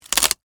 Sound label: Impact Wood Crack, Splinter; Materials; Blast Wave